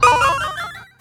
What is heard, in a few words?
Key Item Collected; unsighted